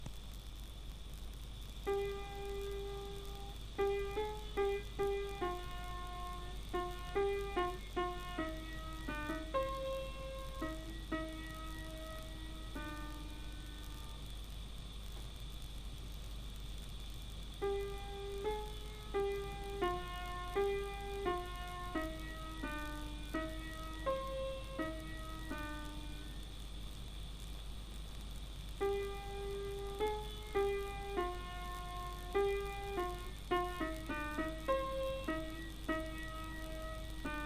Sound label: toccata and fugue in e-flat major; lunar